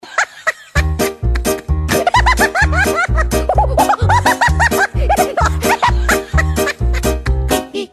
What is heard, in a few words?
haha hihi; spongebob; phx new taunts pack; props taunts new